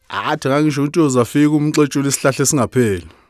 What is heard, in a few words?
Vocal Chirp, African Voices